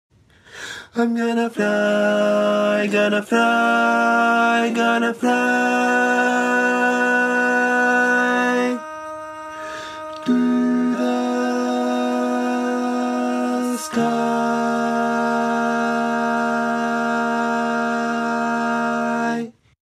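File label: Baritone, barbershop tags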